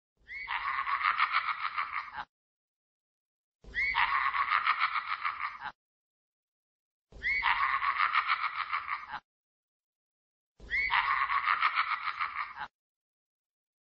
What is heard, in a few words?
laugh funny ringtone; joker; big bang